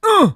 Player Pain; liblast

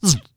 Weapons Bullet Fly By; liblast

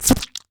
Bullet Hit Player; liblast; Weapons

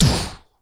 Weapons Handgun Shoot; liblast

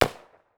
Handgun Shot; liblast; Weapons

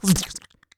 Bullet Hit Player; liblast; Weapons

